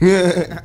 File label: laugh